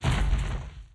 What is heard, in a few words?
spirit death; FlyForInsanity